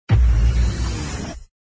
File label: explosion